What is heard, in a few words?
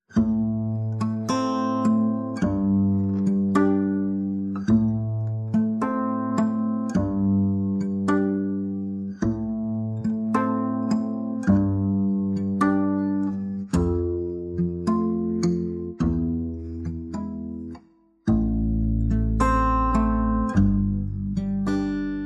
Guitar Bass and Dulcimer Only